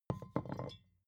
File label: vodka put down tipping